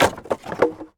IMPACT Wood Plank On Wood Pile Multiple; mono; IMPACTS; Universal Sound FX

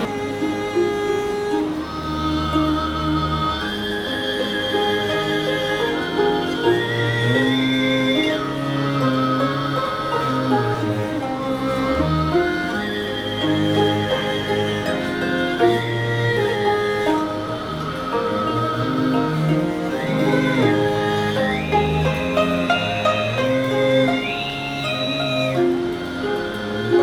russia mongolei; best service Blue Box

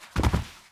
fall floor; Apotheorasis

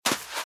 Footsteps Sand Dry Run Left; RadwinIsland

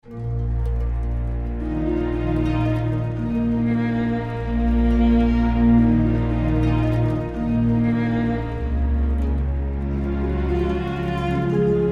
Outlandish Orchestra; Monument Studios

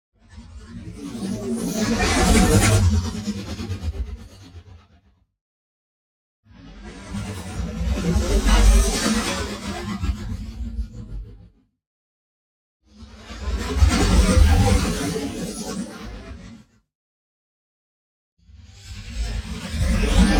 NEON WHOOSH LARGE SLOW; Next Generation; boom library

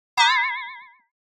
Impact Comic Pulsating Metal Hits High; Hollywood Edge; sound designer's toolkit v2

